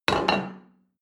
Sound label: plate place table hard